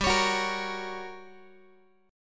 G Minor Major 7th Flat 5th G, Guitar, 4A, 4E, 5A, 5D, 5G sharp, 6C sharp; strummed; x,15,16,16,14,x